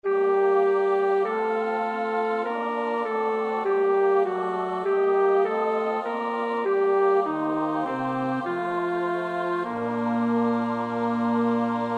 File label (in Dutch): bas; Bach (Johann Sebastiaan); BWV 010; 7.Lob und Preis sei Gott dem Vater